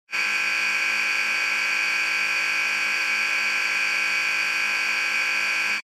Electromagnetic Buzzer Dry Long

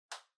Calculator Far Battery Cover Close

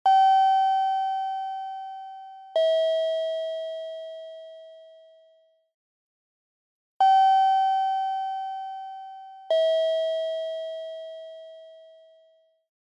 Ticket Gate Signal Loop

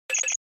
Short Accent Low Double

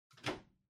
Wall Outlet Far 3 Pin Plug In

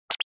Motion Agility

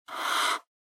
Felt Tip Pen Straight

